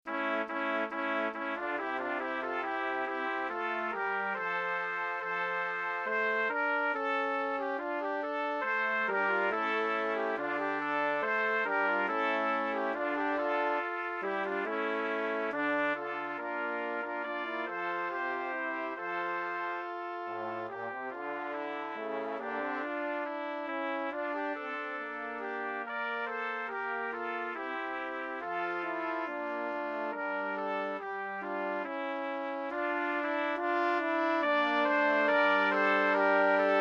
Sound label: Sovra tenere herbette, Brass Quintet